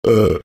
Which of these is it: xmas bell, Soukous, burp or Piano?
burp